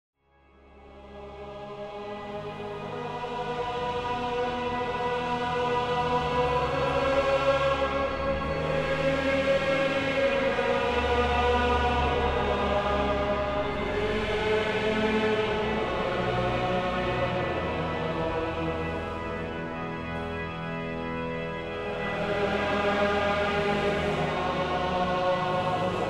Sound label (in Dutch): orgelkoraal psalm 115 en samenzang vers 7; psalmgezang; Geloofd met psalmgezang